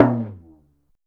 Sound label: TALK DRUM; AKAI S-Series CD-ROM Sound Library VOL-5